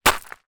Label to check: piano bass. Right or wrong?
Wrong